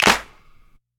clap one shot d sharp key, hand clap sample tuned to the D# note. Loudest frequency: 2553Hz; single hits one shots